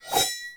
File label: Bayonet Melee Whoosh Neutral Accent; Warframe; Tenno